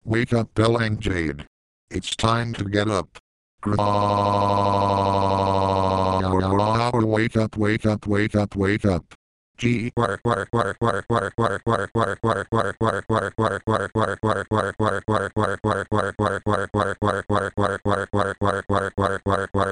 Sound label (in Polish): budzenie budzika smieszne